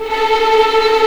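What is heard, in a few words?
A4 TREM P VL, violins tremolo; East West; The Ultimate String Collection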